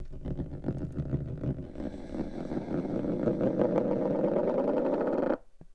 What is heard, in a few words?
transport noise; Brickshelf